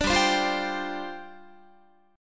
G 7th Suspended 4th G down Guitar

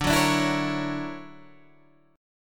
D Augmented Major 9th down; D+M9 strummed; JGuitar

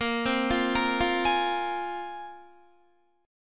Bb 7th Suspended 2nd Bb down Guitar; Bb7sus2 arpeggiated

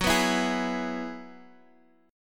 Gb 9th Suspended 4th Gb, Guitar DADGAD; chordshape; down

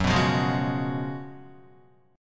Gb Major 7th Suspended 2nd Suspended 4th, Guitar; GbM7sus2sus4 strummed; JGuitar

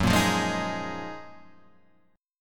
F sharp Major 13th Guitar Half Step Down

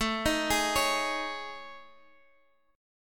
A Major 7th Flat 5th, Guitar, Drop D; chord

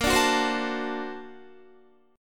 Bb Augmented Major 9th Bb, Guitar; Bb+M9 strummed; JGuitar

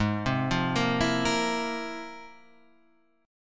F Minor Major 11th

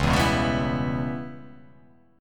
C sharp Minor 7th Flat 5th C sharp

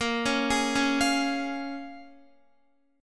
Bb-Minor 7th-Bb Guitar; Bbm7 arpeggiated

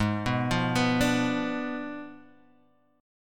Ab Diminished 7th Ab; arpeggiated; 4,2,3,4,3,x

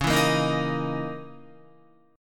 Db Minor 6th Add 9th Db down Guitar; Dbm6add9 strummed; JGuitar

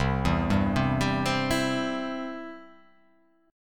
C Major

